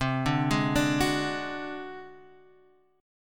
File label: D 7th Flat 5th C, chord